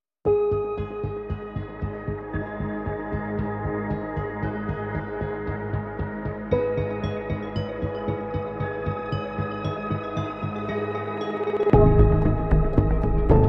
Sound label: Nocturnal, No Drums